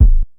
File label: Kick, Ensoniq ASR-X Pro